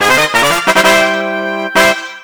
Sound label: fanfare